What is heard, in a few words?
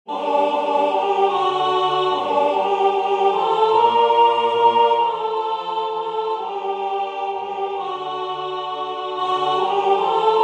alto; Jan Josef Bozan; Nitida Stella